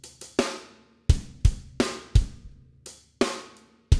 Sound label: drums; musdb18